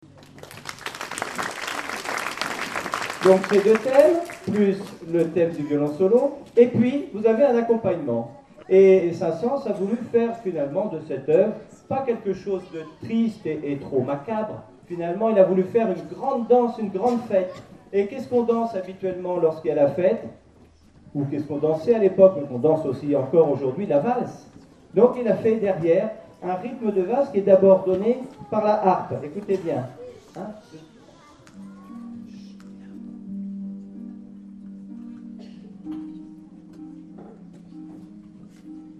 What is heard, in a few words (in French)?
accompagnement valse